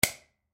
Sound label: Light Switch Dimmer, Light Switch Dimmer Push In; Household; Blastwave